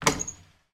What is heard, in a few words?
door louvre wooden open